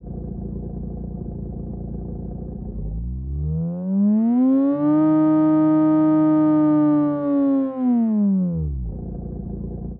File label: rotary x8, engine sound